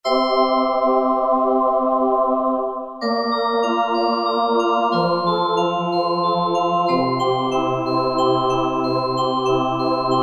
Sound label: NewAgePd; XG Voice examples